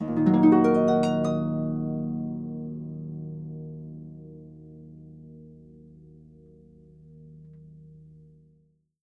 D# MIN, Percussion & Harp; Miroslav Vitous

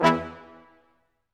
BRS Quintet staccato, Roland; Roland L-CD702

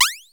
PEWW

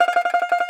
Tenor Banjo, Plucked Strings; E-MU Producer Series Vol. 7 – Old World Instruments

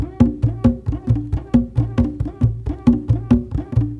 RITUAL DRUM; Spectrasonics; Heart of Africa 2

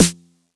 snare one shot high noise; Sample Magic; Classic Chicago House; Drum Hits; snares

